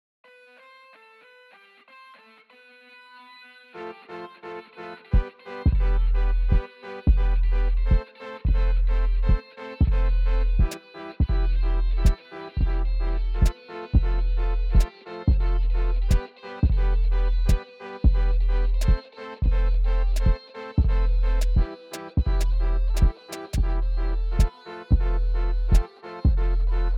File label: Sanka beat